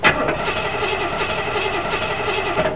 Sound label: engine trouble